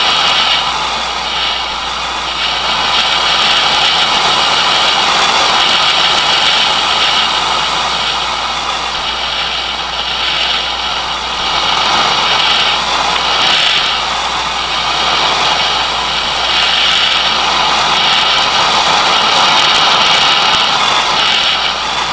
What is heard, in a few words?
jupiter l burst; ufro